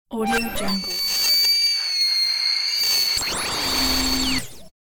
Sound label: horror thin scream; cinematic designs